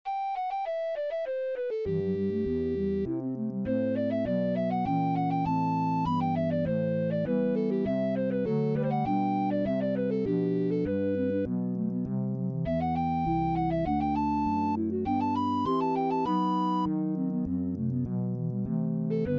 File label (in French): arias pour orgue